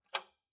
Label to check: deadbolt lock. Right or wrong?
Right